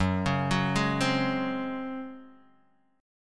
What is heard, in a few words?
Db Major 7th Suspended 4th Gb